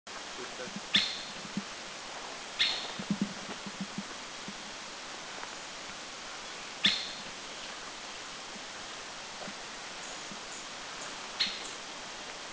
Stripe-breasted Woodpecker, Doi Lang in Thailand

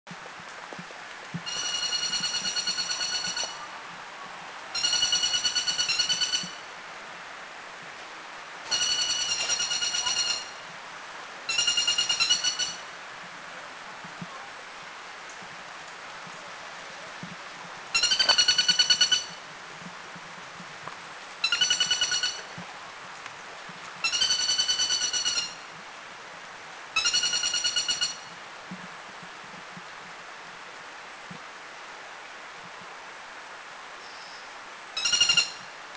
Greater Flameback, Thailand

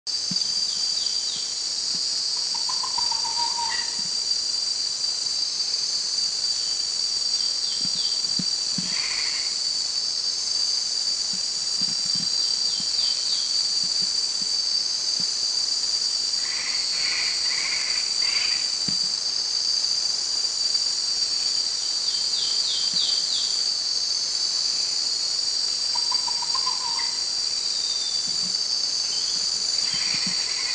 Black Magpie, Thailand

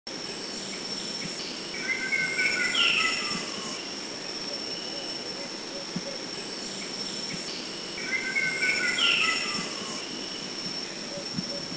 Philippine Trogon Makiling, Philippines; Philippine Trogon which is fairly common here

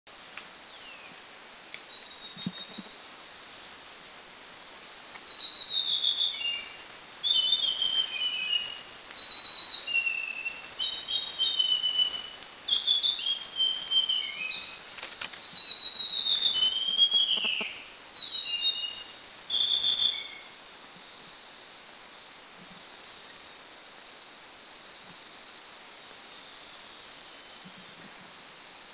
White-browed Shortwing Kitanglad; White-browed Shortwing Brachypteryx montana; A brief sighting at Kitanglad, more heard.

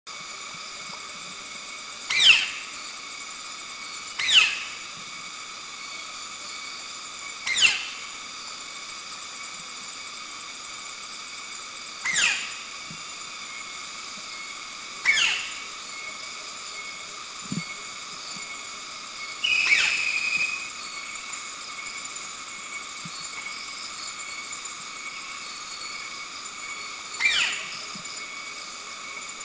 White-bellied Woodpecker Call Subic; Dryocopus javensis; Subic Bay; up to 3 sightings daily at Subic Bay

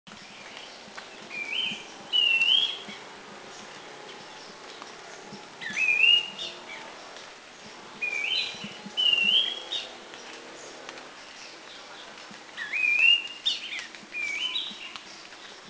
Streaked Wren Babbler; Thailand

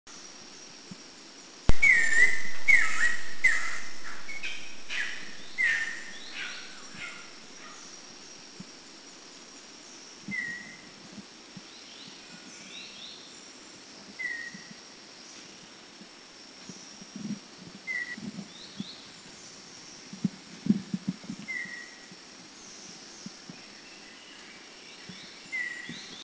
Spotted Kingfisher Makiling; Spotted Wood Kingfisher

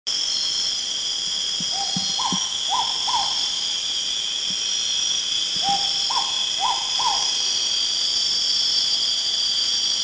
Philippine Hawk Owl; Philippines

